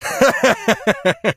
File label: bandit smeh; STALKER; minecraft